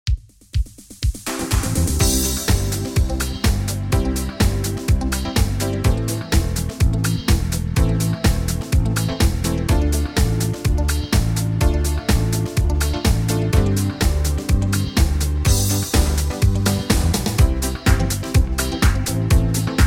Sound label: Party Beat; wersi